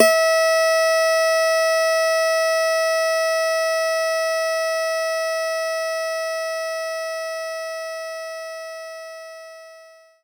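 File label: Acoustic Guitar B3; knights try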